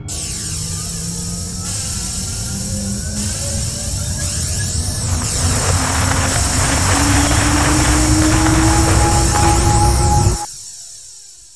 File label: self destruct glowing light beams; Earth and Beyond; EA Games